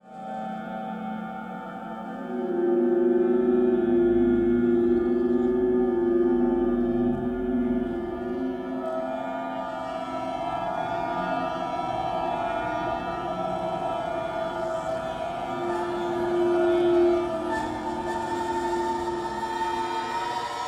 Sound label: bass excerpt